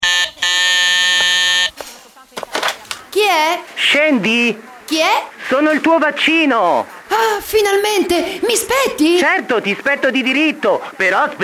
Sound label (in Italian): karaoke; RADIO 30 HAPPY AGEING 2017